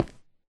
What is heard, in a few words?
stone, step; MinecraftConsoles; Minecraft.Client; Windows64Media